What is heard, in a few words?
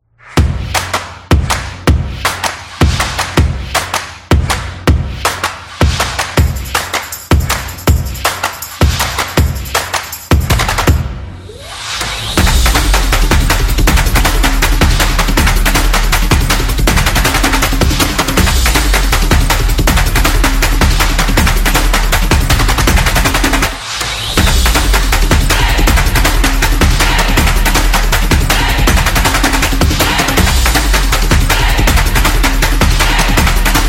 Gym Energetic